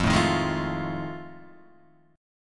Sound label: F 7th Sharp 9th, Guitar, Open D